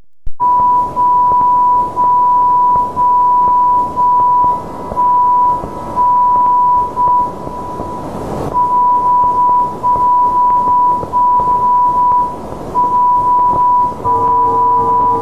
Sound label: JJY 40kHz